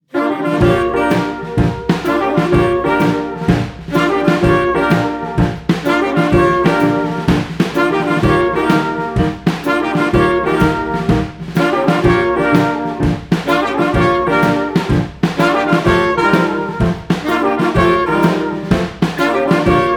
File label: Marching Blues; Greed Corp